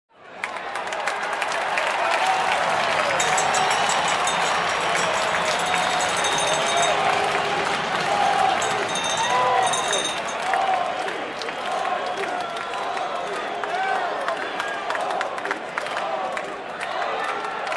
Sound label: Football viewers in a bar cheering